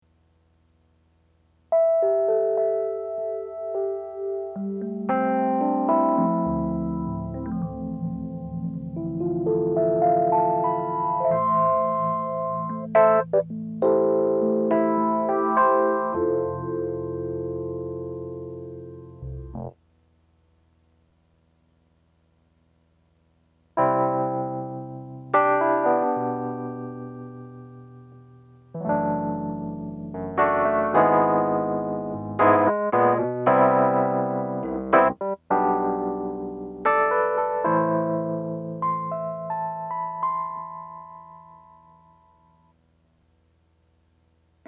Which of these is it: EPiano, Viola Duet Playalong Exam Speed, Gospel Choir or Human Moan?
EPiano